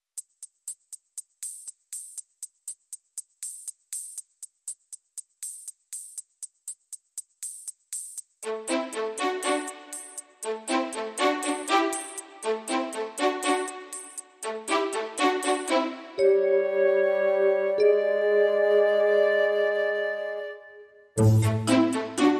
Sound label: Modern Percussion No Drums No Leads